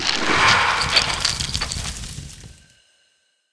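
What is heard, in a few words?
skeleton dead, monster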